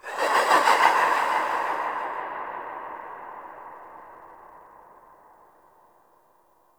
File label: Movement Accent, ambience; Warframe; Harrow Quest; Operator Twin